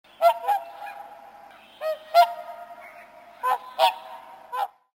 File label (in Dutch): wilde zwaan